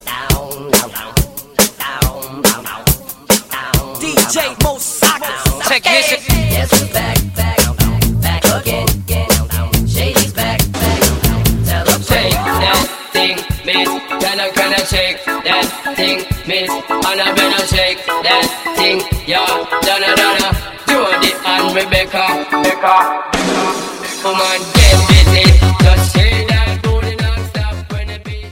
techno mix, ringtone

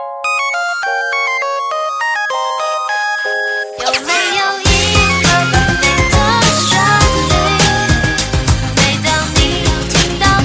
cute nokia mix

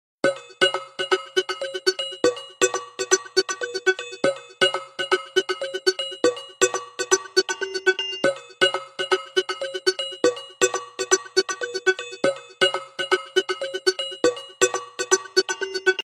oriental beats; nokia; ringtone

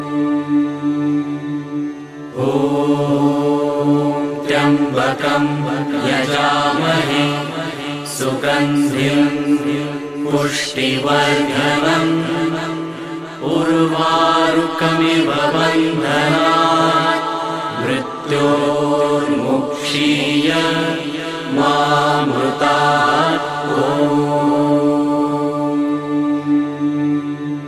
mantra; Mahamrityunjaya

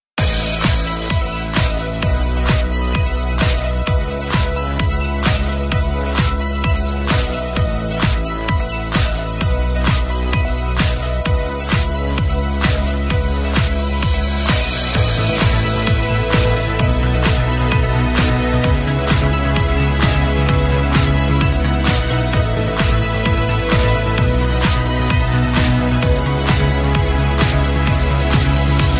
groove piano; ringtone

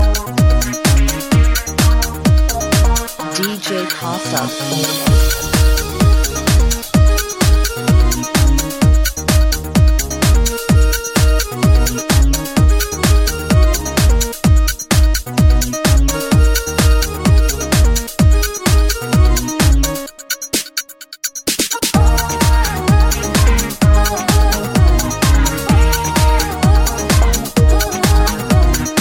with jazz

